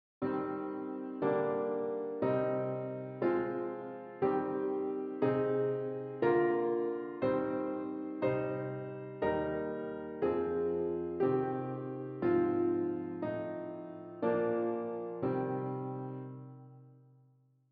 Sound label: harmoniya solfecio